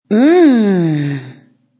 Female Moan mm, Female sighing; Groaning; Voice

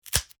Wood Break Snap Crack Foley; Small; Materials; BBFX

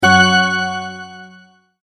multimedia tone correct

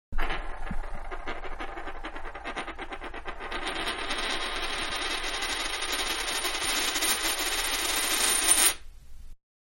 Quarter Spun On Counter, Coin; Money (Sound Effects:Objects); Manhattan Production Music

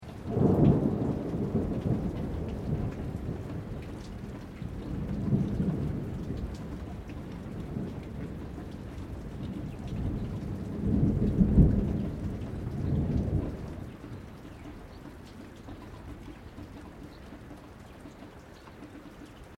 THUNDER DISTANT LOW LIGHT RAIN BIRDS

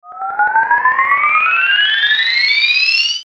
Ascend Phone Reverb; Blastwave

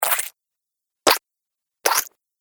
Slime Splats, Cartoon Sound Design Sound Effects; Pack 02

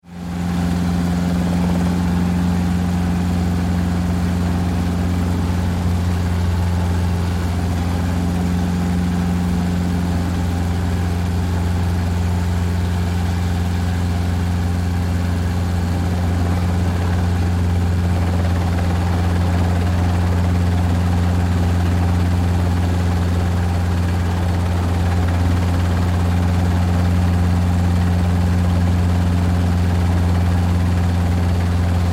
Vehicle Helicopter Interior In Flight; Helicopter Sound Effects; Helicopters (Sound Effects:Vehicles); SFX Bible 6

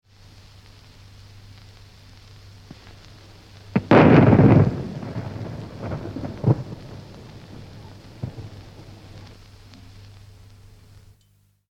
Single shot and reverberation (from 78 disc); Pistols (Sound Effects:Gu; BBC

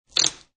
Pulling masking tape with rip; Offices; Masking Tape Short Pull And Rip

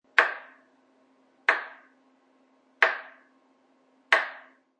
Chef uses large knife to cut on cutting board.; Chef Four Knife Cuts; Food Prep; Restaurants; SFXsource

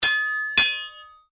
Strike Metal On Metal Heavy Ring Double; Foley sounds for melee weapons; Materials

